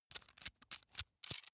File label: metal screw nut sequence short